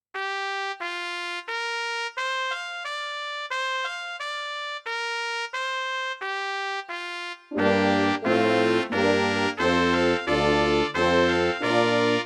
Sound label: SC880